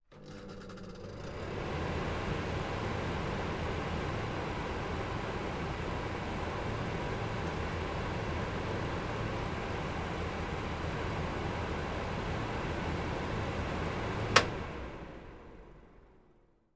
household oven cooker hood extractor fan on operate off